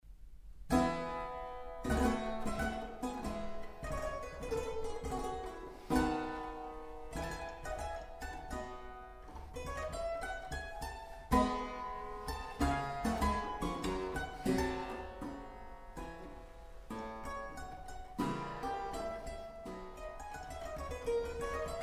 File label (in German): Clavichord, Andante; KV616; Birsak